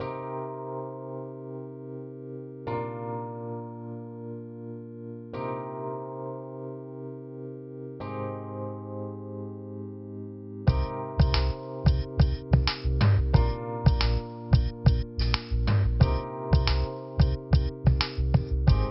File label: room piano